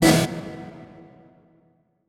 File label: Transition Hit Impact Short; Adobe; Production Element